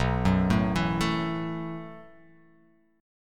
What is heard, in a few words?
B 7th Suspended 4th B down; B7sus4 arpeggiated; chord shape; JGuitar